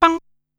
"Pan", Korg Wavestation-SR Drum Kit

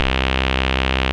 rave beep C1; Best Service Alpha Dance vol.2

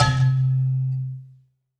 C2, Synth Line; 90 s Sample CDs; NiceBeats; SynthLine VOL-15

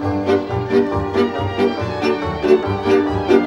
VIOLA GROOVE; Best Service; World Colours

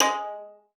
VIOLIN SPICC, G#3; Xsample VOL-5

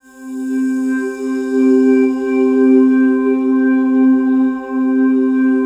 PAD, Drum & Bass 160 BPM; Ueberschall; Drum & Bass Flash Trackz

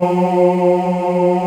CHOIR AAHS G; Greytsounds; Scott Peers Sound Engineering

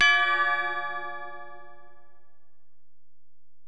Bell, Alesis DM-PRO

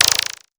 twitter, Prophet Five, Analog; E-MU Formula 4000 Series Vol. 3; Analog Odyssey

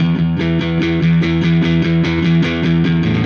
Silos Dirty Strum Guitar, Indie Rock

